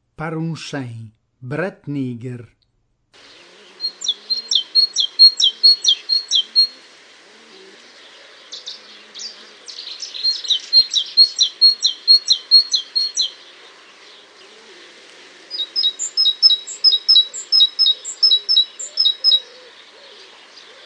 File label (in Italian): Cinciallegra